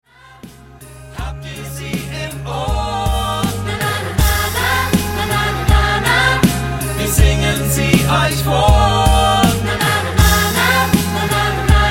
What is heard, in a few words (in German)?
Kleine Melodie; schweigen silber reden gold